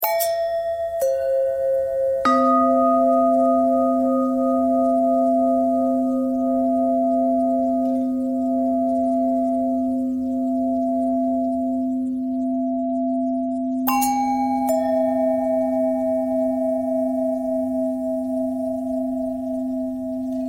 long bell bend threads; bells

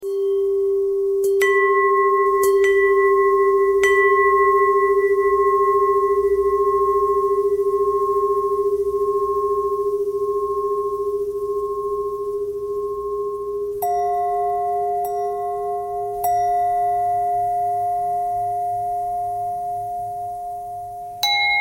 bell bend threads; bells

